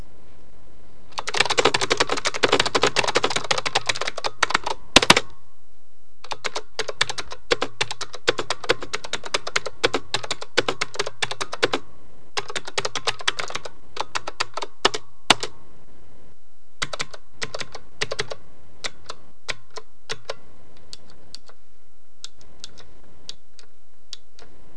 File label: typing